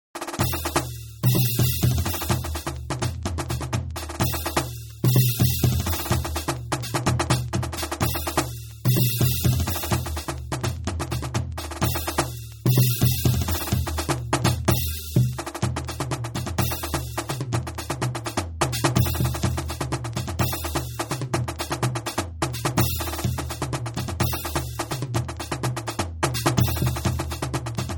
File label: funky rhythm